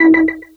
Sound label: Menu Enter